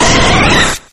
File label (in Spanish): Grito de Jolteon